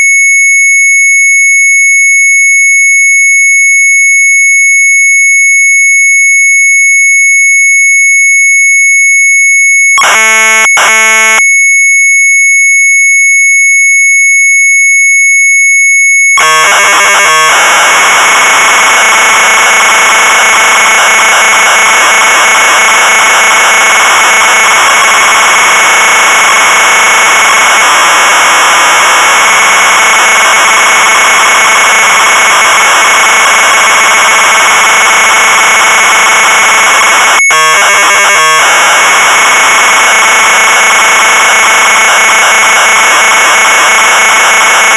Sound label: Sharp MZ800 Tape; CURSOR EDITOR; retromelsarchive